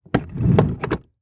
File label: Auto Saturn Adjust Seat Interior; Transportation